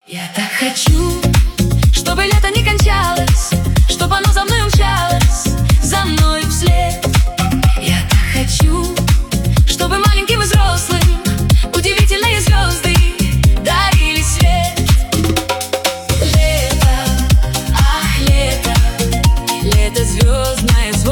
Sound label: afro house version